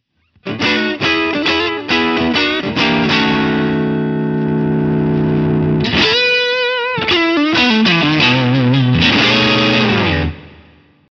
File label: Guitar volume down; Halcyon Adapt II